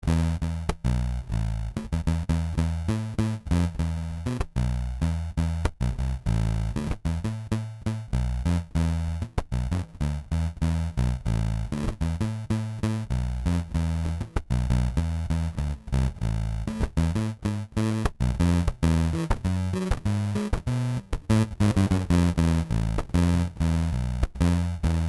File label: distorted